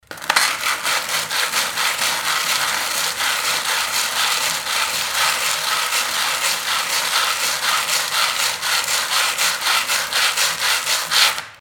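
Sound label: sandpaper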